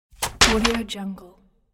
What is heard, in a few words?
dropping items in shopping bag